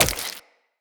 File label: Tenno Wall Jump Flesh, footsteps, wall run; Characters